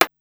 Hammer, Korg Wavestation-SR; Wave 455 - Drum Kit

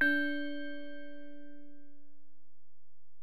Bell, Alesis DM-PRO

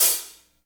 HH HH, HH Real

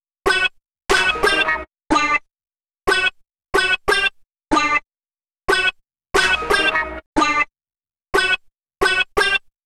Melody, Hip-Hop

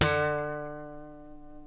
E, funk guitars

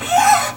SQUEEK, Nylon Guitar

